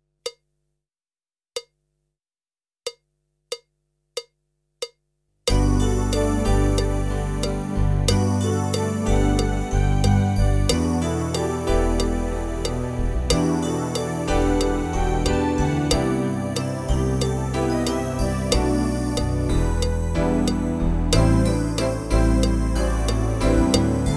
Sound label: Strollin Thru Backing Track